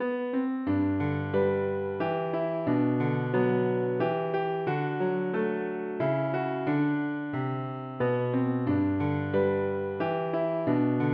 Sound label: piano